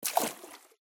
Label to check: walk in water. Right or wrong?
Right